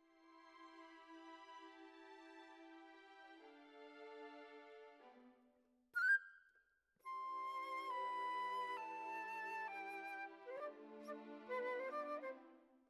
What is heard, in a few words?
Flute